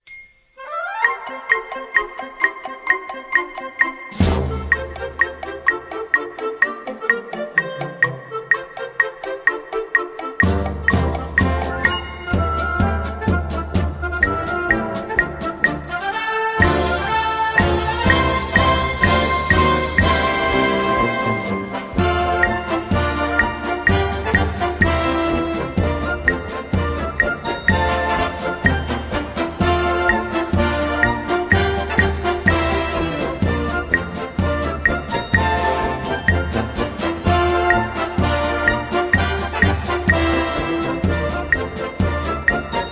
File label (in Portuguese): percussao